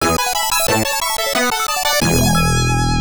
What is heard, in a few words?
Round Clear; puzznic taito l system arcade